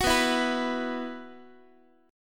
B Suspended 4th, Guitar; strummed; 4A,4E,5A,5D,5G#,6C#; x,x,9,9,8,10

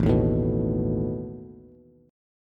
A Suspended 2nd Flat 5th A down Bass; Asus2b5 strummed

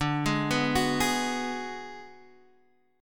B Minor 6th D

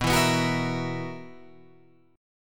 B Major 7th Suspended 4th, Guitar Open F chord; BM7sus4; strummed; 11,11,11,13,13,11

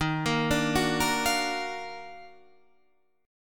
Eb Minor Major 9th Eb, chord

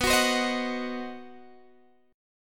C Diminished B, Cdim/B chord; strummed; JGuitar

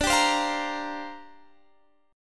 C 7th Suspended 2nd Suspended 4th D

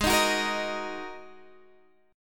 Ab 7th Suspended 2nd Ab, Guitar Half Step Down

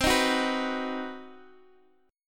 C Minor Major 7th Flat 5th, Guitar, Standard; strummed; x,x,10,8,7,7; JGuitar

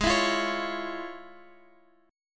F Minor Major 7th Sharp 5th, G sharp

